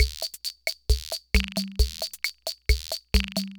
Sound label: Loop, sr-95; classic machines